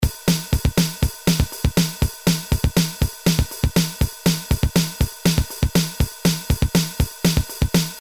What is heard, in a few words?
Hard Rock, Boss DR-220A Preset Patterns